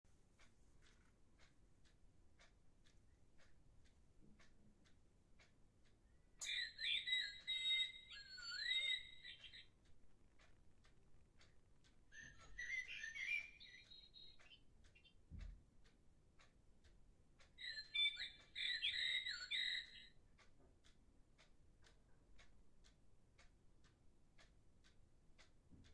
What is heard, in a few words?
both bird clocks tweet the hour one after the other